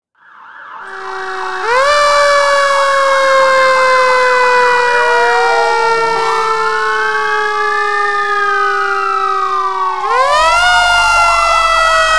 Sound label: Siren Fire Truck